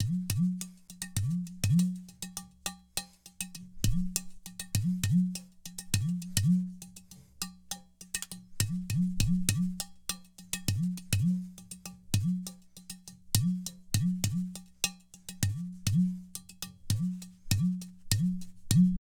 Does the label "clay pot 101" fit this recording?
Yes